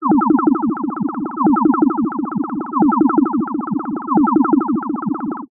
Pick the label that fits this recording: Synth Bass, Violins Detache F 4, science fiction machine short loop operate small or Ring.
science fiction machine short loop operate small